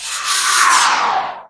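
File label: scream; The Elder Scrolls III - Morrowind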